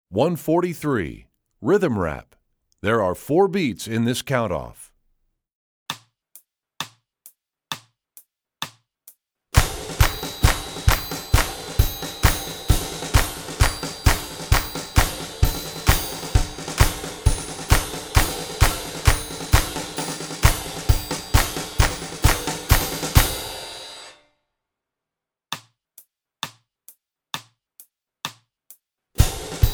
Rhythm Rap, Strings; EE2000 Book2

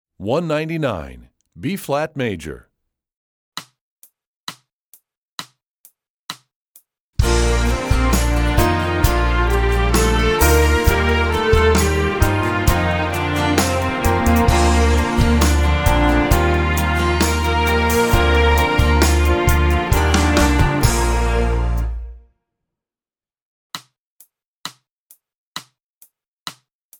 B flat Major, Strings; EE2000 Book2

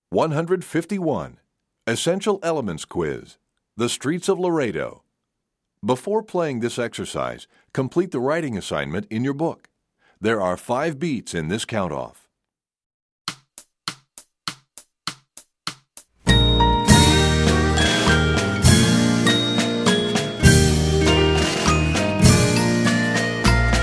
Percussion; EE Quiz; EE2000 Book1

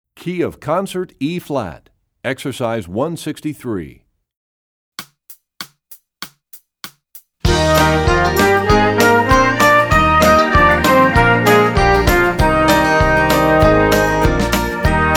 Studies Concert Eb; EE2000 Book2; Rubank

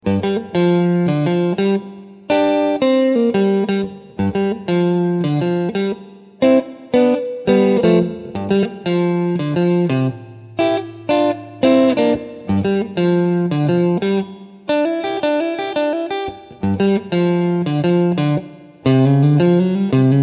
Solo Blues

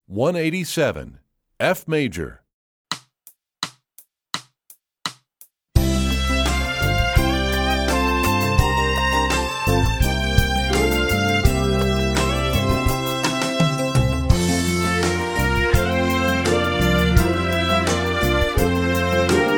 F Major, Strings; EE2000 Book2

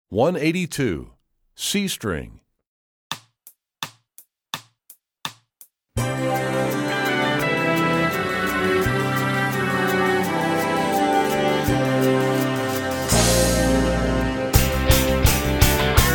C String; EE2000 Book2

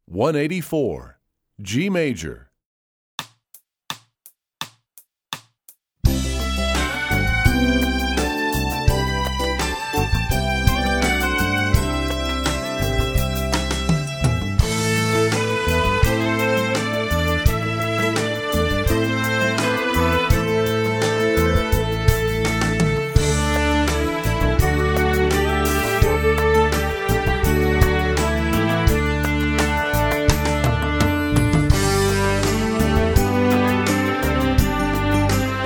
G Major, Strings; EE2000 Book2

